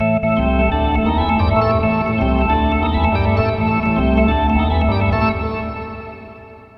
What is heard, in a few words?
Ditty